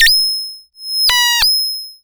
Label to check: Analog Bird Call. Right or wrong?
Right